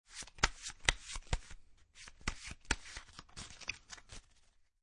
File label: Cards Playing Cards Shuffling; Playing cards, poker, shuffle; Lynne Music